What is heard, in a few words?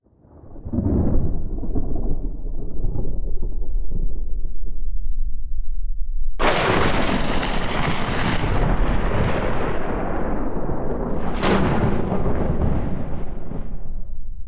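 Ambient Storm Loop; silent hill homecoming; ps3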